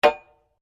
SLEDGEHAMMER METAL IMPACT HEAVY; Working with a sledgehammer; Hammers; Tools

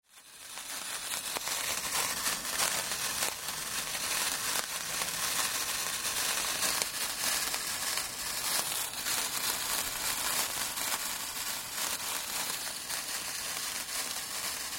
Fuse Burning, Sparkler; Flames; Objects; Tom Hutchings

